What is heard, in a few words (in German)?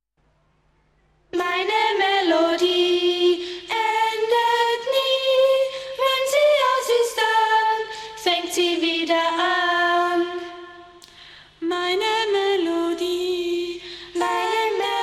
Meine Melodie Nur Gesang